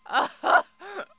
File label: people